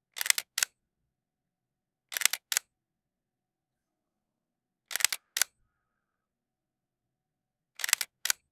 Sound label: soft box camera flash; photography